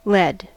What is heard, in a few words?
lead, voca